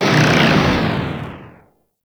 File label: Proj Large Fire; Warframe; Lizzie